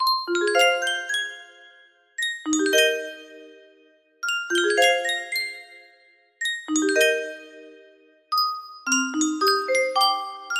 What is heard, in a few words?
lullaby, music box melody; poppy playtime